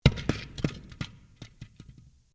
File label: Stone On Stone Large Impact; Material components for constructing more complex sounds; Drops; Objects